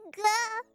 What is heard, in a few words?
guh